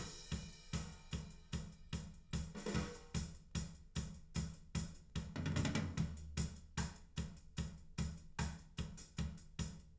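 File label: with drums live rec 1m; SASSEC07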